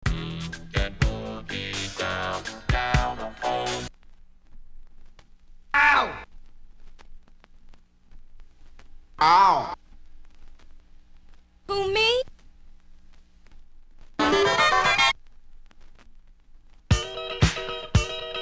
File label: samples scratches; wicked mix vol 018